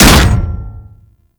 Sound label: Hammer Impact Flesh; Warframe